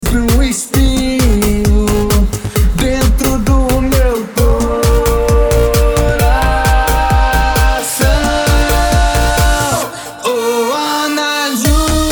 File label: mega funk